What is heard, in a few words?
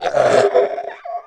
Hybrid Death; Playable Tutorial Level; TR3